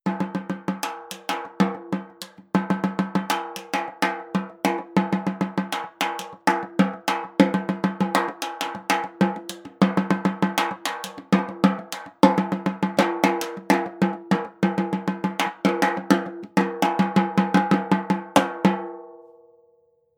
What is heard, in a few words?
drum repinique sound; contemporanea; brazil